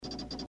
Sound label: fast 5 clicks